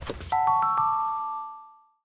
jingle gift box open

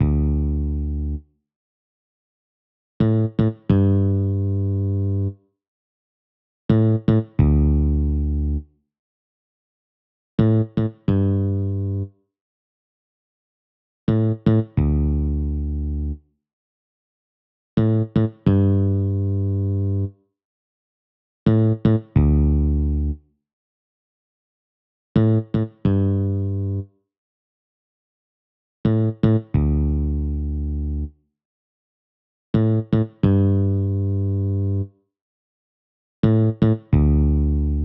bass; fl soundfont player

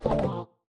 pickup; gnarpy

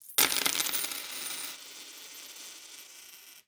Coins Drop; SFX Producer

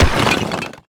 stone break

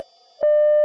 bleep noise

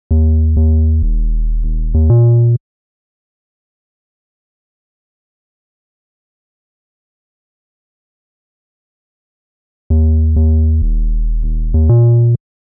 bgm sub

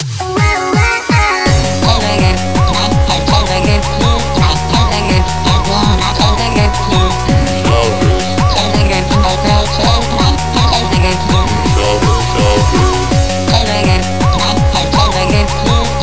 loop

